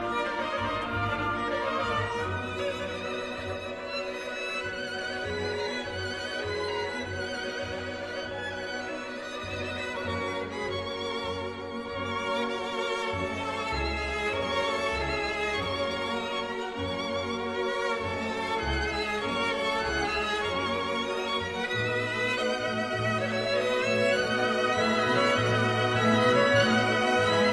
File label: Cello; Dvorak Masur New York